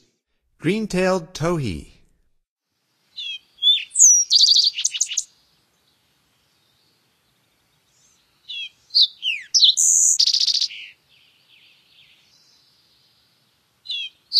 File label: Green-tailed Towhee, Songs of Birds of North America; Stokes